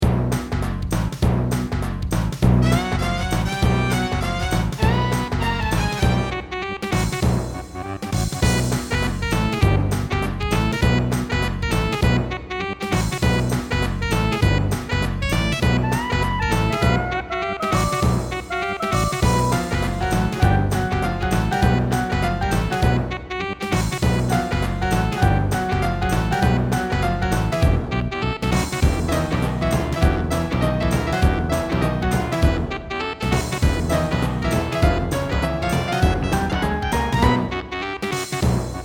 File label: Jazz (Circus); Oh Chute PT 2